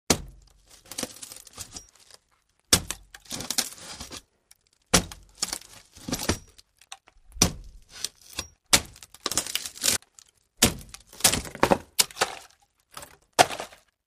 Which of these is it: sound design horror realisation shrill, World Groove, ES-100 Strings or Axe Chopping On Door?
Axe Chopping On Door